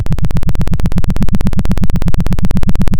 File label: pop, Sound of a helicopter